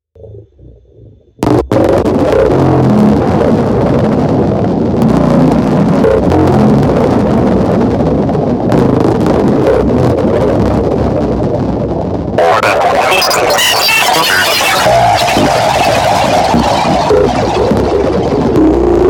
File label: Glitch noise experimentation